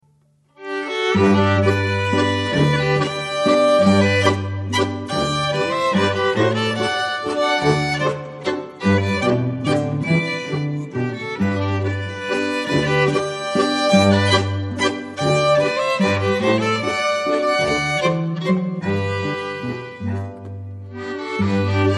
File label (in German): landler